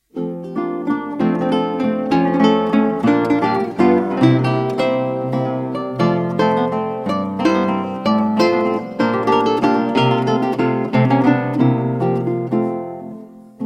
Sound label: Mazurka